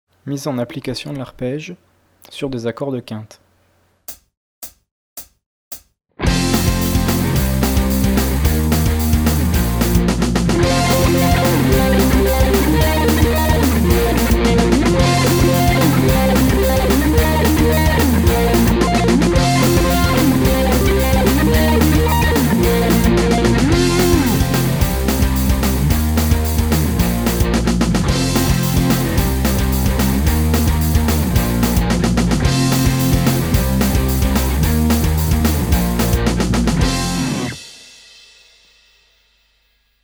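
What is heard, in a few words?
fifth arpeggio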